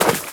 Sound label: Chopper Flight; SNES; Urban Strike